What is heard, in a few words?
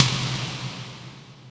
Short Reverb Steel Snare Drum Sample C Key, snare sound tuned to the C note